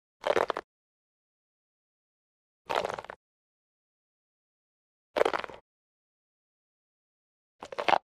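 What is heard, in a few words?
biting crunch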